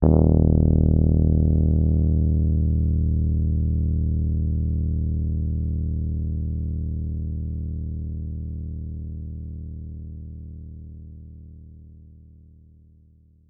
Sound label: Rhodes forte d#1; Best Service ProSamples vol.42 - Session Instruments